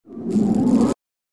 small zap; Electricman 2

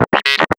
coin entry

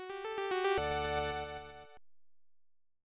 Music - Jingle

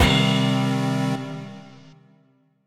Retry Jingle; Friday Night Funkin Inescapable Aisle ost windows gamerip 2022

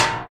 shield hit; Plants vs. Zombies

